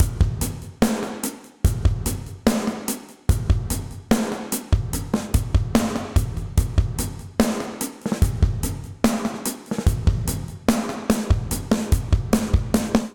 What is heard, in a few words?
Bricks Main Dark Plate Verb 73bpm, Session Drums; Ableton; Factory Packs; Session Drums Club